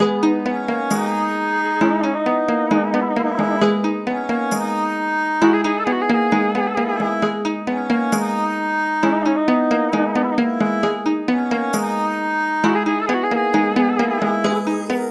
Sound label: 133 BPM G# Min, World; Bazaar